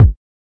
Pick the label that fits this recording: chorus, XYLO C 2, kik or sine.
kik